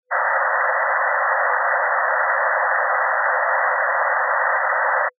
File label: noise bandpass